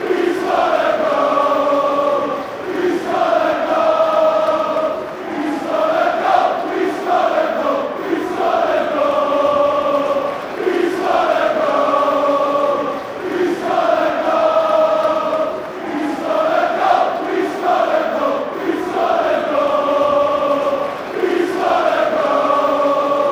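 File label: we score a goal, football songs and soccer chants from England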